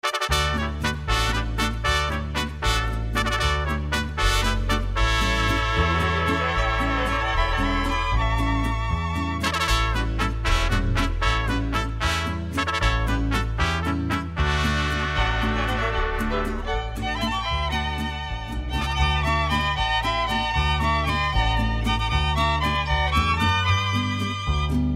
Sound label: mariachi